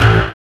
SYN, dance; Sound & Vision - Gigapack II

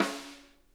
snare rim, drum kits

